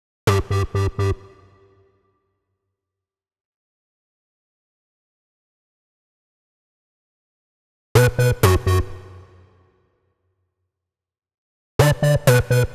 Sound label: 125 Bm Bass, electro synths; Prime Loops; Complex Electro Synths; Precinct13